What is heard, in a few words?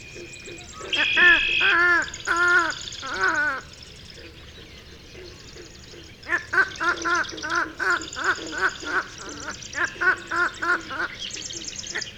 Pied-billed Grebe Podilymbus podiceps; waterfowl